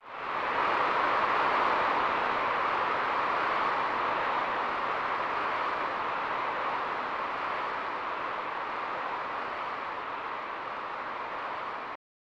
Applause; Total Football; SNES

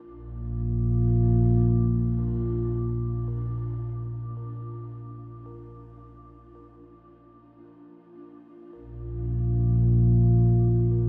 Music Wet (No Piano)

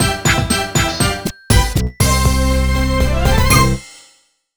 Jingle WIN TITLE; Super Scribblenauts 2010 NDS

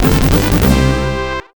Good Rating; Lethal Enforcers II: Gun Fighters; Genesis

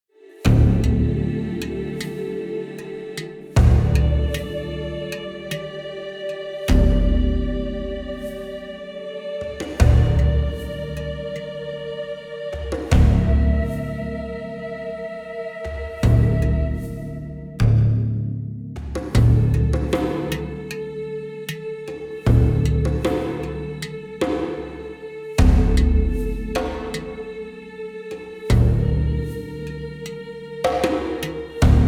Exploration; Skellige

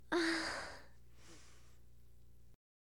Massage; SFX Bathroom; Hilzartov; Fairy Size Doesn't Matter

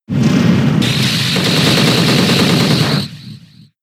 Bug Buzz; Pokemon; gen 6; XY; ORAS

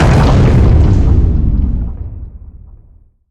Music Stinger Death; gamerip; Oddworld: Abe's Oddysee New 'n' Tasty

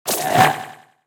skelly windup; Fight Knight

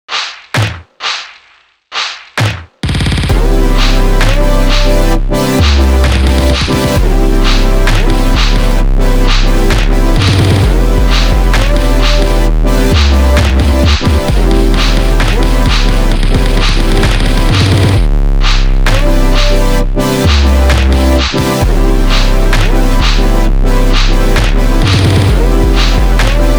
Lo-Fi Trap; umurangi generation official soundtrack

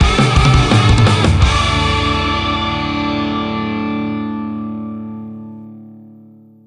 Mission Complete! Jingle; The Simpsons Hit & Run; PS2; Burning Rubber

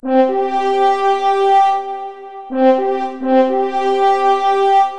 Horns; Short Piece; Midas Gold Plus

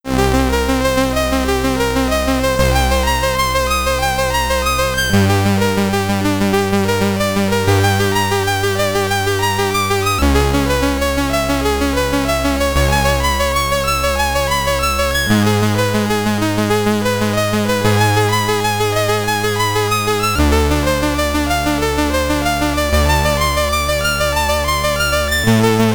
Battle Theme (SID Stereo); Slaine; Commodore 64

